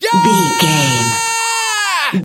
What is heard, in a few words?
voice male c battle shout